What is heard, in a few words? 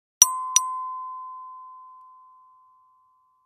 ding ding